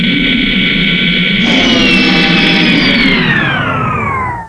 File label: ship idle